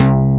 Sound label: BASS, SYN BS; AKAI S6000 CD-ROM - Volume 1; SAMPLE WAVE MIXING 1